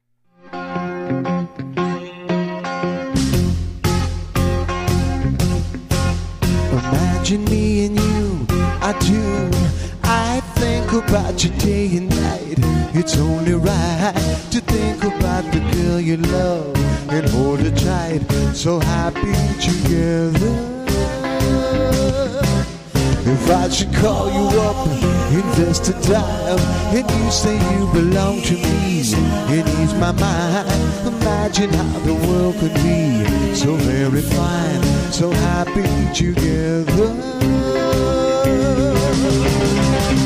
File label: 50s 60s Band